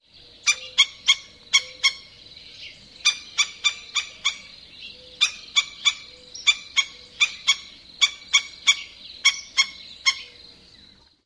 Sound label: chi calls; TX